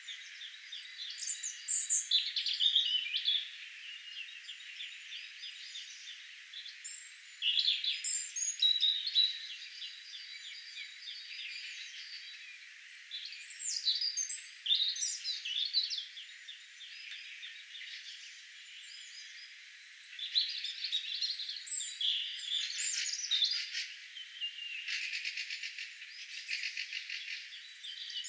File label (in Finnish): tuolla tavoin laulava punarinta